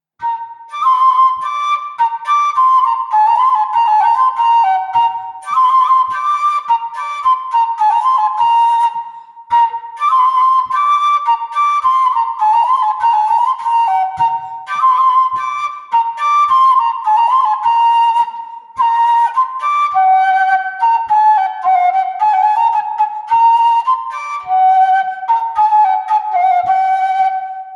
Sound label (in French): Autre Bourée Airs pour la vielle